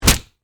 Impact Punch Face, Impact, Punch, Face, Meaty, Flesh; Punch And Hit Sound Effects; Combat; BlastWave